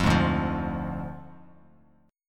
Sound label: D Diminished D, Bass Standard; strummed